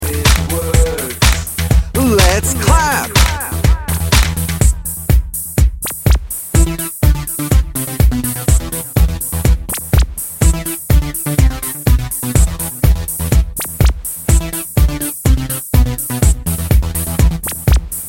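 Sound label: instrumental; Songs for Teaching; open version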